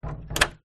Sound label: household bolt metal gate movement